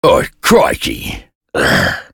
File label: death; awesomenauts; Smiles